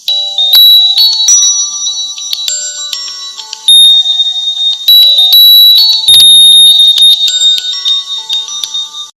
oppo reno7z 5g ringtone

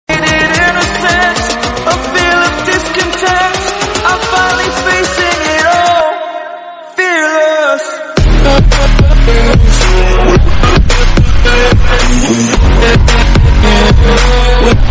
fearless party dance music tone ringtone; samsung ringtones

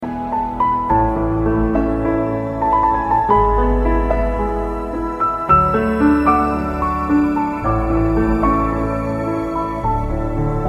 beautiful piano ringtone

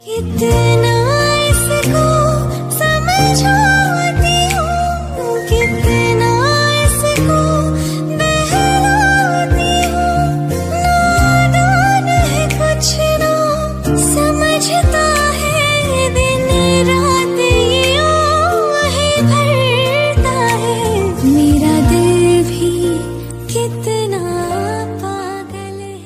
bollywood ringtone

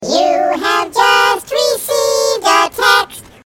chipmunk text message ringtone

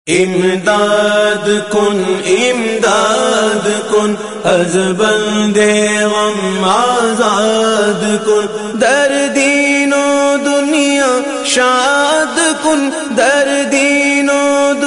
naat ringtone

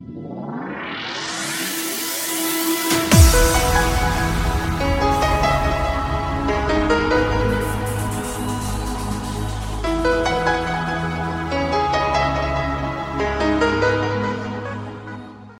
pleasing phone ringtone; nokia

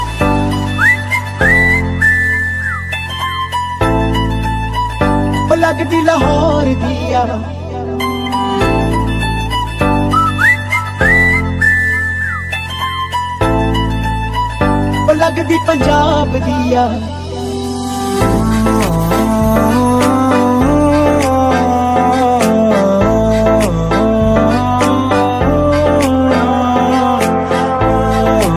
sad bollywood ringtone; bollywood ringtones

